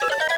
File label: Chipmunk; Animal Genius